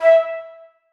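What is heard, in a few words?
flutes staccato d#3; Sonatina Symphonic Orchestra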